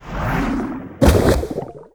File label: Siren Typhoon Die; Seer; GamigoNA